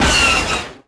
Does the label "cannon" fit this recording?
Yes